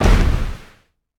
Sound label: magic